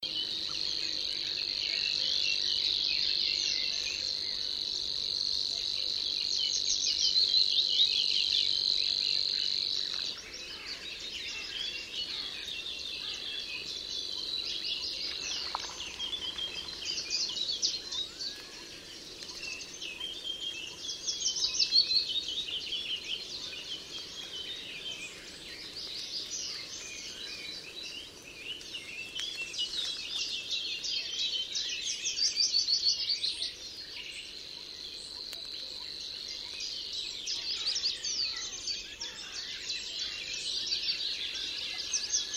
Rohrschwirl, Altmuehlsee; WUG; Susanne Rieck

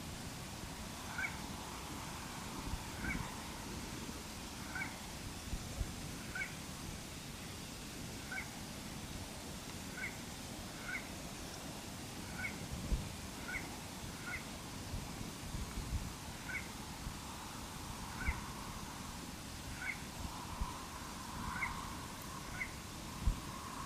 Kleines Sumpfhuhn; Grabenstaetter Moos Chiemsee TS; Joerg Langenberg

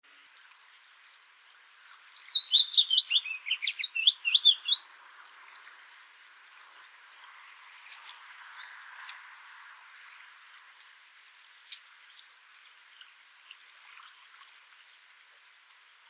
Fitis Zilpzalp; Oberammergau GAR; Michle Pron

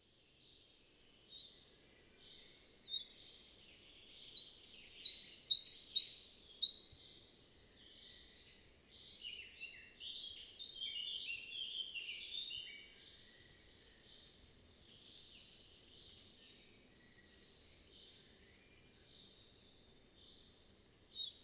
Zwergschnaepper; Bayerischer Wald; Johannes Honold

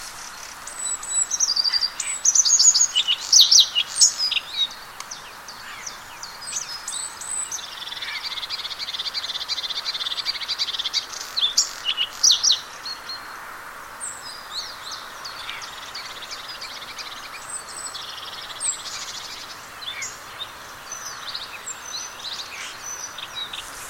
Blaukehlchen; Echinger Stausee LA; Michele Peron

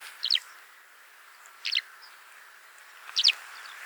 Feldlerche; Wiesmet AN; Johannes Honold

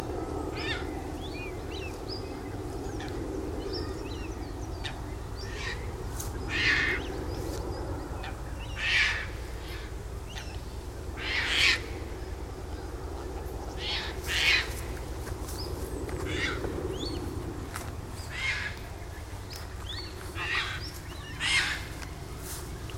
Raubseeschwalbe; Ammersee Binnensee; Julia Wittmann

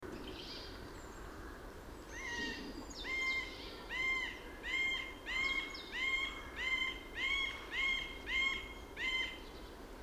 Mittelspecht; Landshut; Susanne Rieck

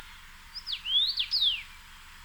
Karmingimpel; Greussenheim; Rainer Jahn

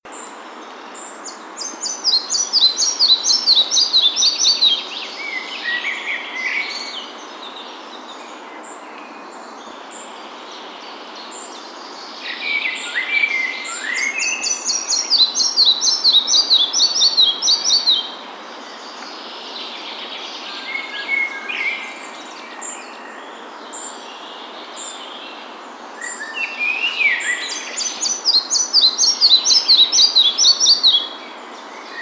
Zwergschnaepper; Tennenlohe ER; Soenke Tautz

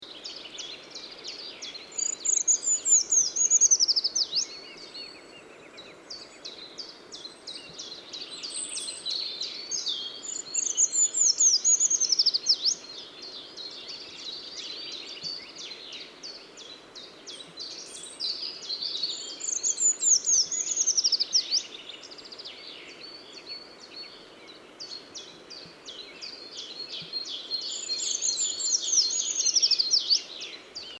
Waldbaumlaeufer; Tatzelwurm; Michle Pron

